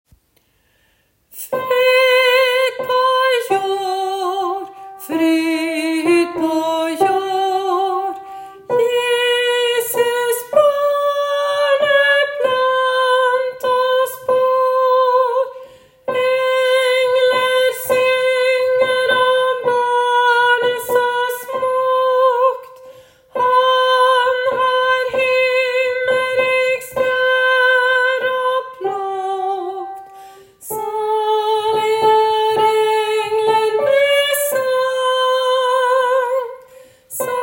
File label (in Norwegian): glade jul vers tenor; Glade jul vers 3 tenor; åfjord